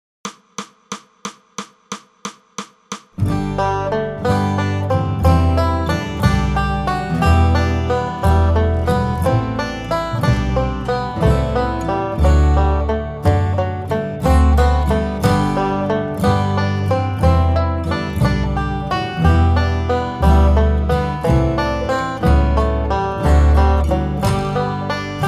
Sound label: Banjo 180; Jesu Joy of Man's Desire